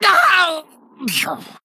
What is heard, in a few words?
Male Vermin Death